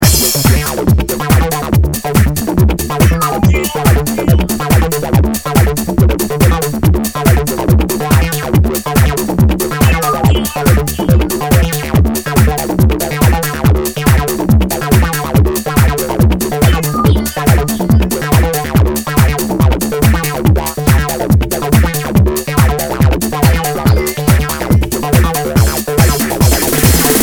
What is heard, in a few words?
bass, analogue